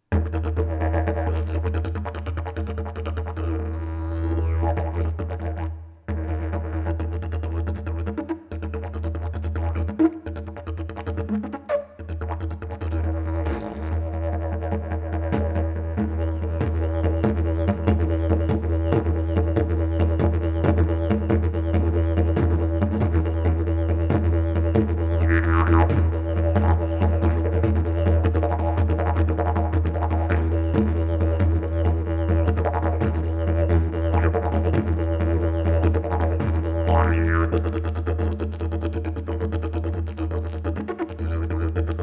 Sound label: didge